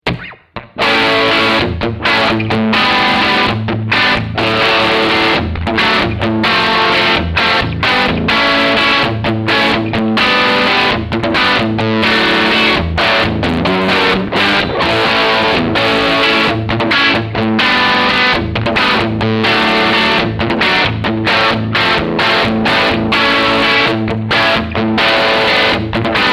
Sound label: Humbucker Bridge Pickup Heavy